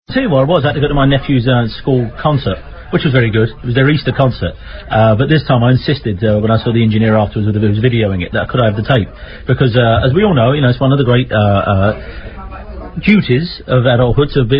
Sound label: orchest